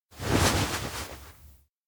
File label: nod; anemoi